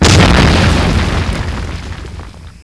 mine explode; XDM

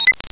alert, turret; XDM

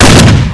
shotgun fire; XDM

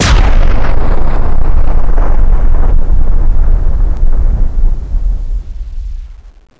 blackball blast, weapons; XDM

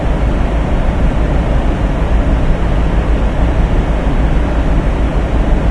airtunnel, ambience; XDM

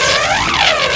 headball fly; hl1mp; XDM; controller

